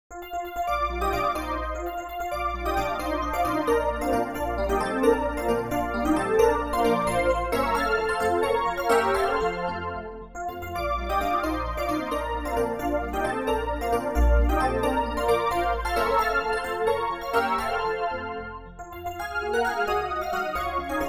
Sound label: Quick E-Piano Waltz